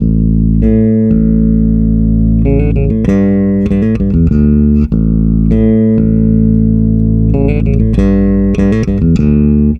SWEET & LOW BASS

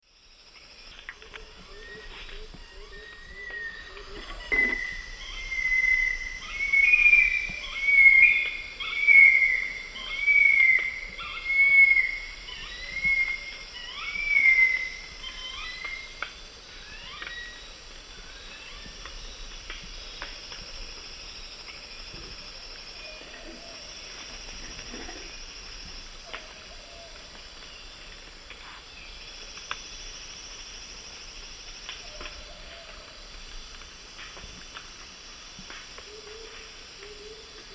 Leucopternis schistaceus, song; S. America; Eastern Lowlands

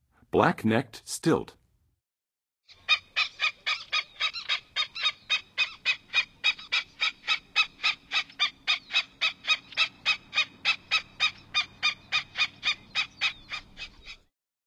Black-necked Stilt, Birds of North America; Western USA; Western USA Songbirds

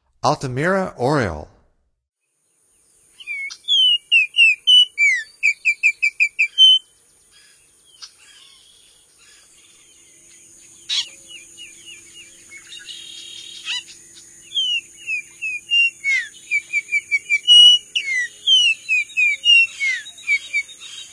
Altamira Oriole, Songs of Birds of North America; Stokes

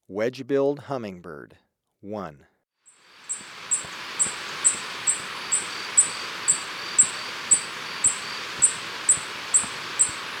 Wedge-billed Hummingbird, Birds of Ecuador; S. America

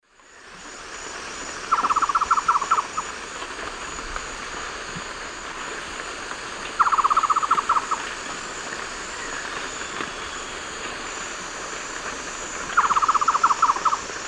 Mytmothera campanisona, call; S. America; Eastern Lowlands

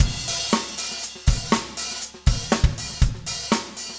funk 120 bpm, loops

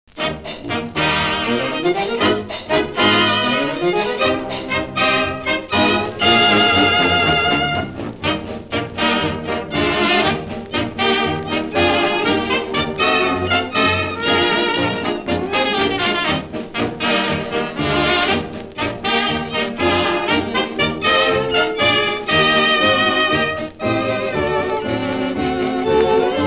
instrumental, Jazz; Popular Jazz Archive 1920-1936

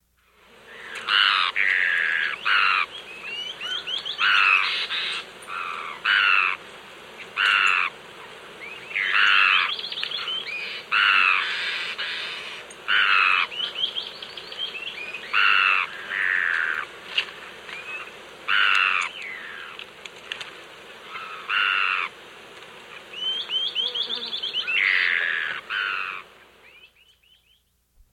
Bicolored Antbird; S. America

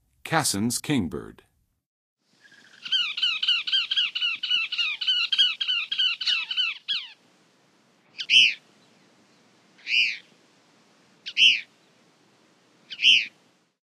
Cassins Kingbird, Songs of Birds; North America; Western USA; Western USA Songbirds

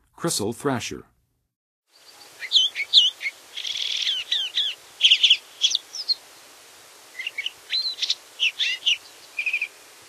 Crissal Thrasher; North America; Western USA; Western USA Songbirds

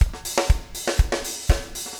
4 on floor 120, loops, 120bpm

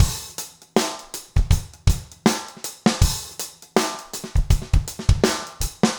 trip hop 80

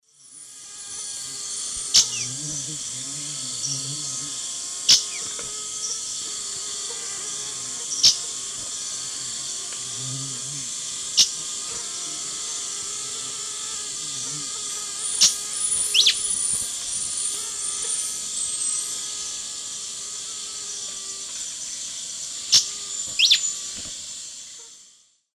Schistocichla schistacea, calls; S. America; Eastern Lowlands

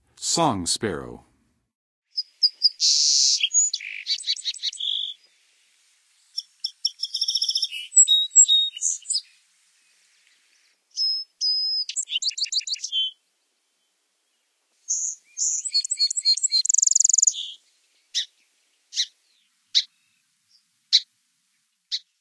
Song Sparrow, Songs of Birds of North America; Western USA; Western USA Songbirds

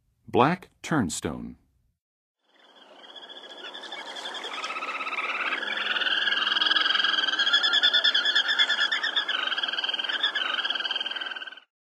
Black Turnstone, Western USA Songbirds; North America

